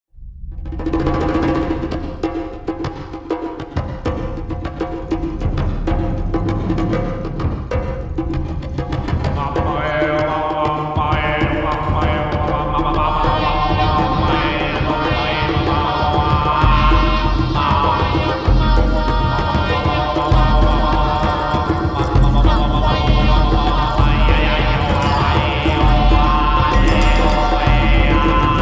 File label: crazy drums